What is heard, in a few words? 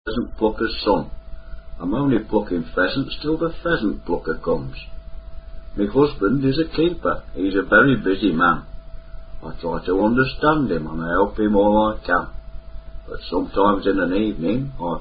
Pheasant Plucker Rehearsal